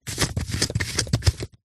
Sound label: foley binoculars zoom dial turns